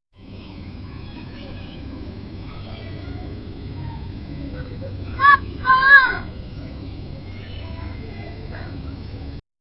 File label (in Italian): campanella scuola distant, bell